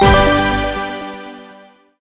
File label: win small drop; dazzle